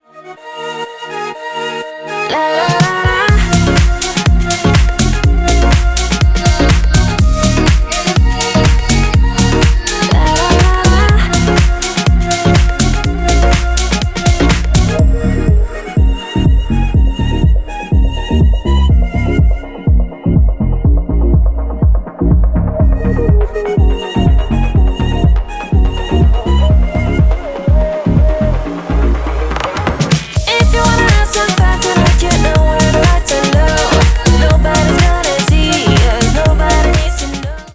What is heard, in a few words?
the flute song; ringtone